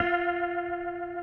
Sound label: GUITAR FX